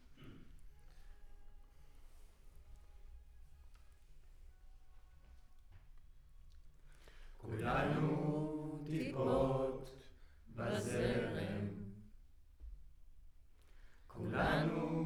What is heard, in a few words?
Center u47, Choir